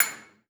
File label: Circle Stairs Hat, Drum Hits; Aberdeen Music Hall; Music Hall Percussion